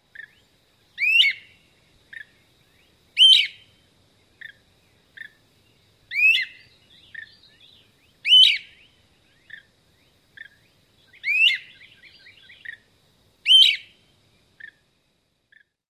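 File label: dawn song, great crested flycatcher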